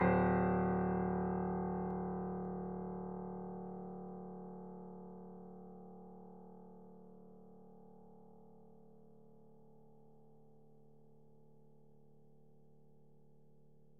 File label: piano ff, steinway